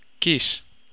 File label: kiss